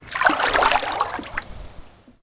Water Lapping Gentle Small; Ambience; Warframe

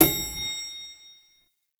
Proto Infested Brachiolyst Hover Ring; Warframe; Abilities

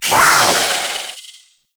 Kavat Vocal Attack Medium; Warframe; Warframes; Khora

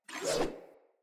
Player Sphere Polearm Whoosh Fill Short; Weapons

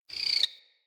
Amb Corrupted Critter Close; Ambience; Mendicant Area

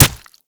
Kunai Impact Flesh; Warframe; Hit Effects; weapons

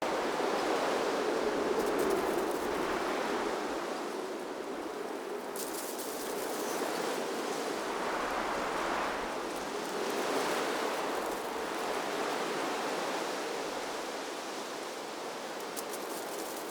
Night Wind Loop

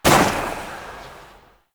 Priest Pistol Shot; Warframe; Tenno

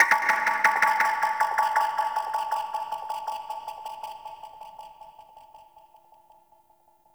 Grineer Frogman Attack, Vocals; Warframe

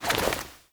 Generic Air Roll Whoosh; Warframe; Characters

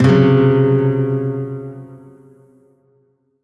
Shawzin Hirajoshi Chord D; Warframe; Lotus Shawzin

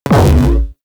Rifle Projectile Pre Explosion; Weapons; Warframe; Corpus; Ruban

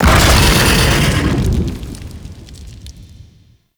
Burrow Exit; Gameplay; Golden Maw; Warframe; Ambience; The War Within

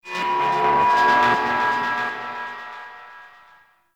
Zariman Mid Texture, Ambience; Warframe; TheNewWar

